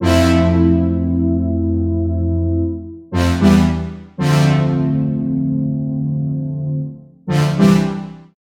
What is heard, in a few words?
cool synth brass 115bpm C major